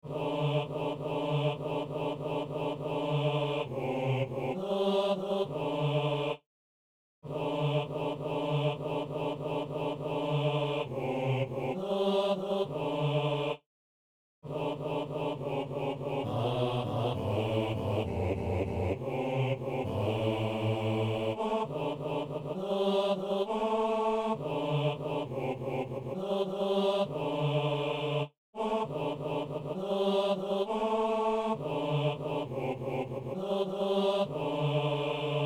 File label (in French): basse; Messe de Saint-Boniface - Sanctus